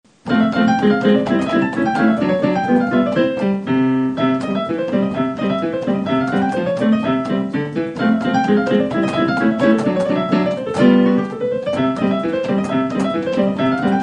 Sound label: jingle